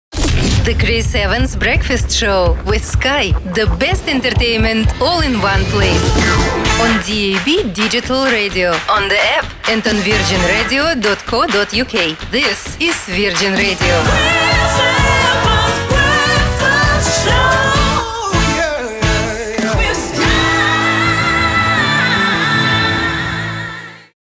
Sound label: Jingles Mix ENG